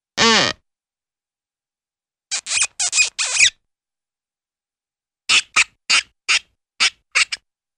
Small Quick Wood Creak - Small Rapid Twisting Squeaks; Cartoon; Hollywood Edge; Cartoon Trax